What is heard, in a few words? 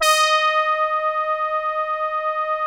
BIT BRASS, Vintage Keyboards; Big Fish Audio - First Call Vintage Keyboards